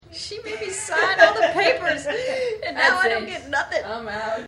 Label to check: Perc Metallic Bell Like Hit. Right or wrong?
Wrong